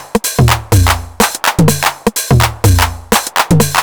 drum xox top 125, Drum Loops, Classic Chicago House